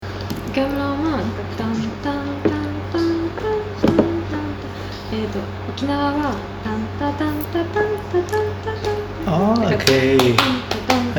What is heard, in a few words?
highlighting scales